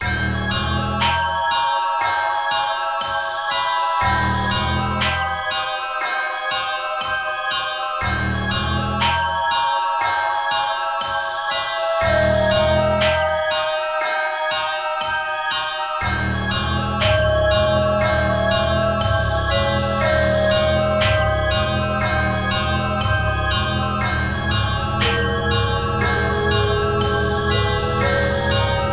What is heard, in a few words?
combined loops